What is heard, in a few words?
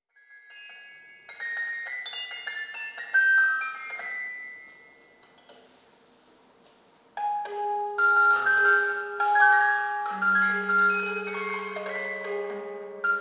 Wind up clock, Strazky castle